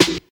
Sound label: airy sizzle snare sample d sharp key; snare drum samples